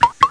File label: message